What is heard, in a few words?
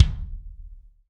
BD AMBIENT, Drums; Real Mega Drums